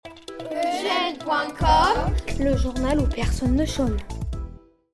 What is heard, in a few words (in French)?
Jingle; def personne ne chome